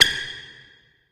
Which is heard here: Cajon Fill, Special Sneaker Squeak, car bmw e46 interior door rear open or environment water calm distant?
Special Sneaker Squeak